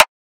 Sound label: drum rim rumba; Virtual Riot; Drum Hits